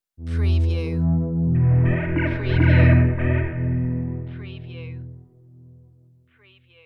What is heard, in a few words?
Scifi Audio Logo Ident, Industrious, Mechanical Remnants